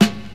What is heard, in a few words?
hot snare g sharp key; snare drum samples; one shots